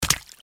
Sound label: Footsteps on wet street shoes single step